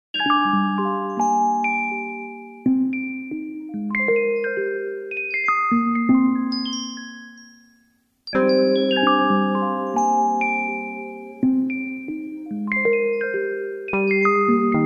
jingle bells hawaiian

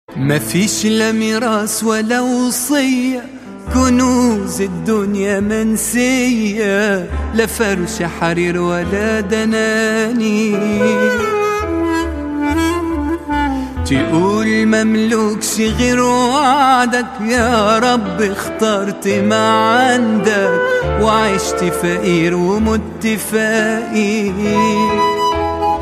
islamic

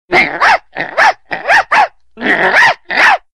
small dog sms; animals

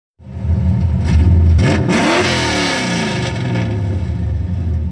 camaro, ringtones

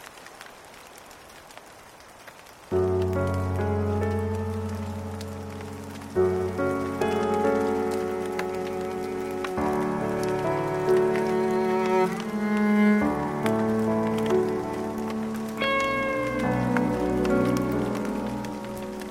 Rain Ringtone

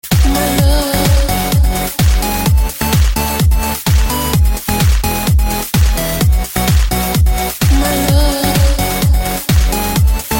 dj remix, electronic ringtones

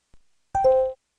kik tone on iPhone

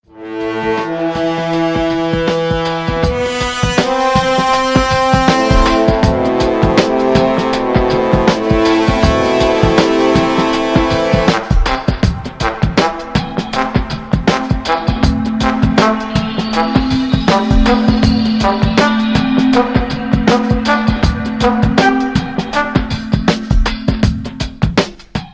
Brass Horn

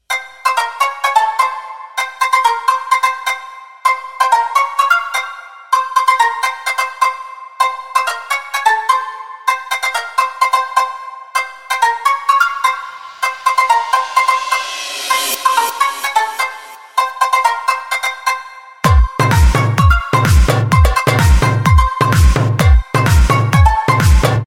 Oriental Mix

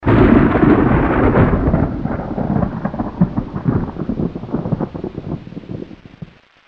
thunder strike; ringtones; games

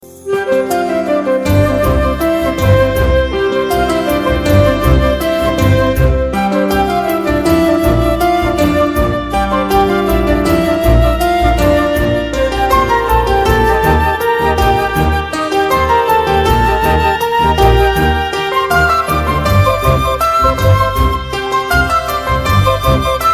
steps of music

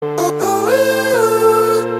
Uhh

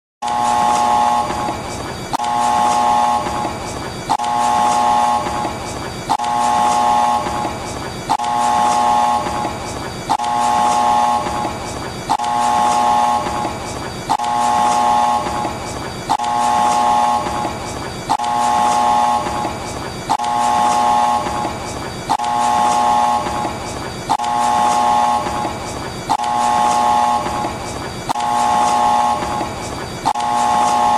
nuclear alarm

